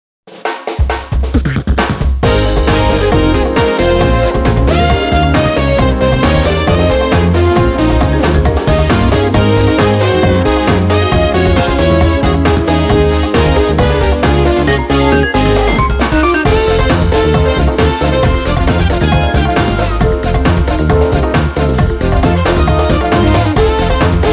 Music Menu event

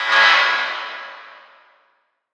Jail Door Servo Unlock Distant

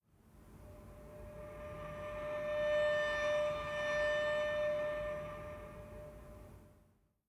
Savannah Music

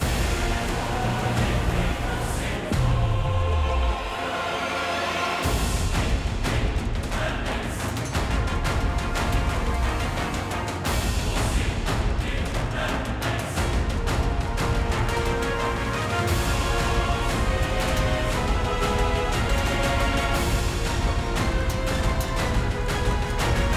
Battle Boss Ruin castle

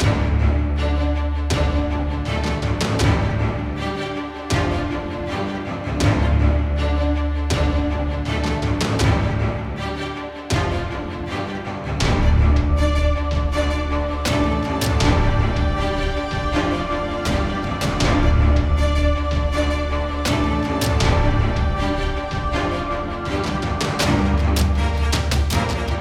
Main Menu heavy part looped; Ice and Fire

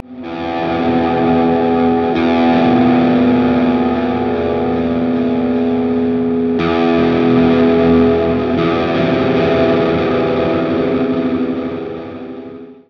Forest Grunge

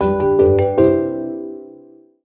Positive Event; Beautiful Girl Fight School

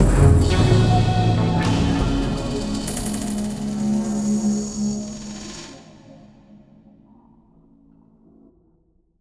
stinger predator

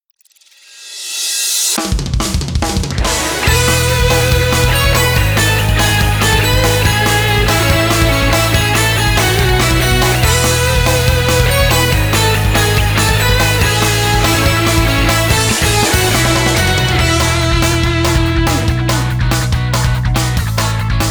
ED short karaoke; Otama Otaku Nakama wa Chikkoi Mania; windows gamerip 2012

